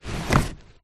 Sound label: household under bed storage box fabric slide carpet